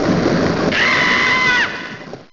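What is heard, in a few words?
waterfall; Exile v1.1.3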